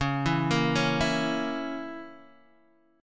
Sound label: C sharp Minor 6th C sharp, Guitar Open D